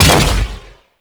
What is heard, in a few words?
Sapient Primary Explosive Impact; Weapons; Warframe